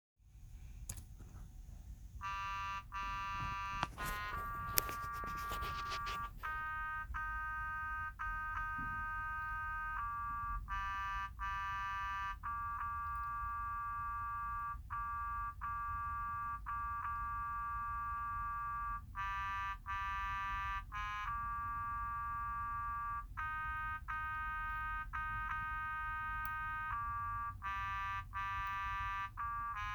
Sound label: Tenor